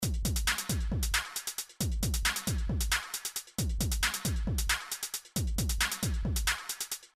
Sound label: basic drum; sample it loop it